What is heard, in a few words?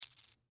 Watch Battery Set Down Drop; Foley; Blastwave